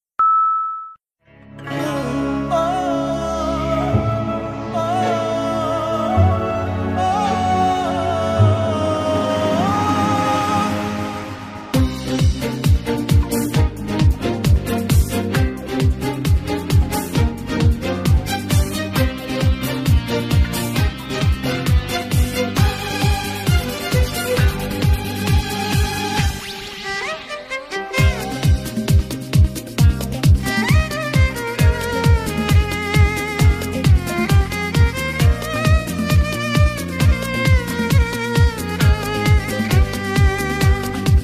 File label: floor music; katelyn ohashi